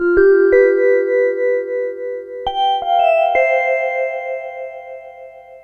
Chime Loop

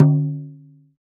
Doff Dom, Roland BK-5 Percussion

